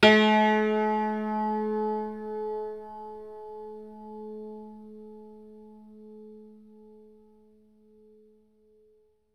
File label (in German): HACKBRETT A; Best Service; World Colours